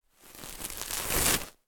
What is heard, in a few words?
horror cobwebs sweep away by hand